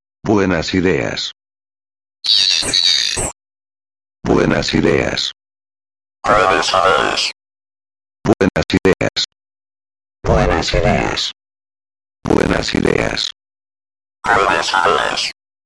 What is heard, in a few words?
RING MODULATOR Vox; CHERRY AUDIO RACKMODE